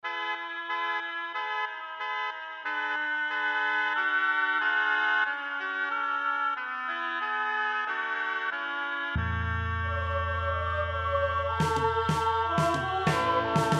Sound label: melotron arturia oboe